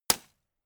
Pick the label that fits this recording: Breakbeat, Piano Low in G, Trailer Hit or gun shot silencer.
gun shot silencer